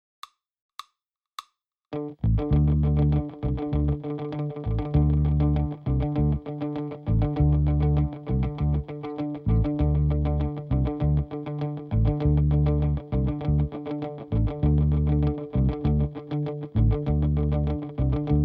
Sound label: backing